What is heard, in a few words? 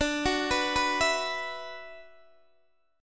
D 6th Add 9th D, Guitar, Open D